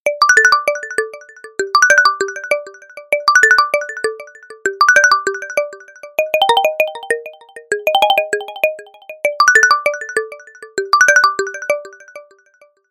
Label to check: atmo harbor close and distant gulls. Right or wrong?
Wrong